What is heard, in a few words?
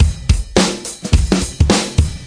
106 Swung Break, drum loops and breaks; 101 to 110 bpm